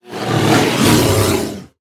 Witchwood Grizzly Play

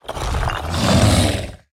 Rock Rager Attack

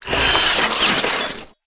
Enter Play

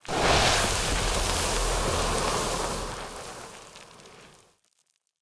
Colossus of the Moon Death Underlay

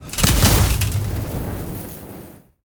Dreadsteed Death Underlay

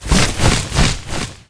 Winged Mount Attack Underlay

